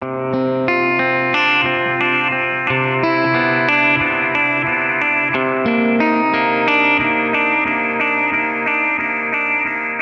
Emotive Jangly delayed guitar alone; CUES for film TV games etc; SAD

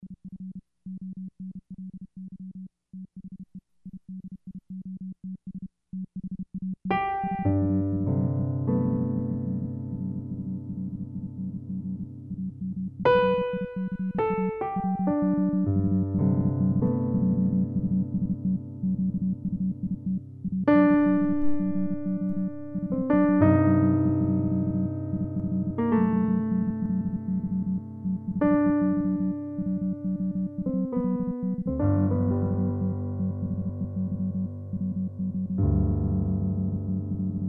piano and morse code

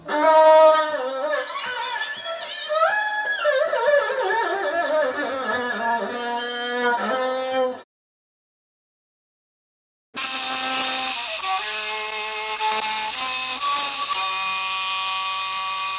Metal Plate Resonator Violin

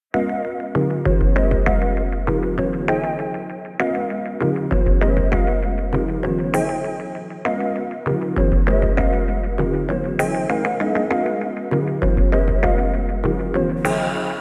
Breathing Out, Electronic Tracks

